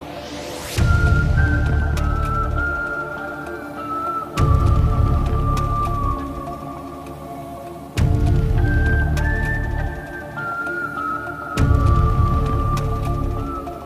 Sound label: Theme Music BGM Ringtone; Breathe Into the Shadows; Abhishek Bachchan